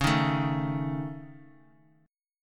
Db Suspended 2nd Sharp 5th Db, chord; strummed; Dbsus2#5; JGuitar

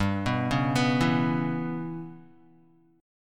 G 6th Flat 5th, Guitar; G6b5 arpeggiated; JGuitar

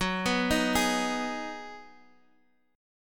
Ab Minor 7th Gb

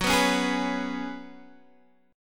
Gb Suspended 2nd Suspended 4th, Guitar Standard; Gbsus2sus4 strummed; 14,14,11,13,12,x

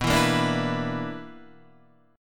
B-Minor Major 7th Double Flat 5th; BmM7bb5 Chord {7 7 8 7 5 7} strummed

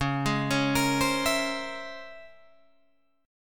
Db Minor Major 13th Db Guitar DADGAD; arpeggiated; 11,11,11,15,15,14

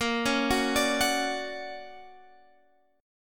D Augmented Major 7th Bb, guitar

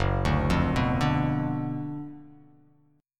Gb Major 7th Suspended 4th Sharp 5th; GbM7sus4#5 arpeggiated; JGuitar

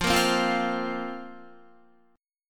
F Suspended 4th F down Guitar; Fsus4; strummed

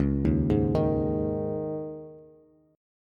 Db Suspended 2nd, Bass; 9,6,6,8; arpeggiated

